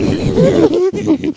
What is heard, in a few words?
zombie attack; mystic radar; mr zombie1